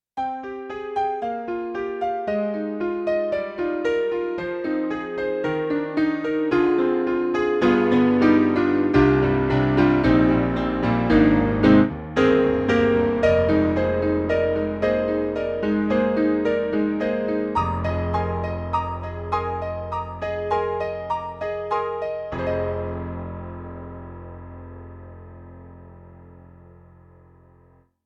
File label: Ragtime Piano; Kurzweil MicroEnsemble ME-1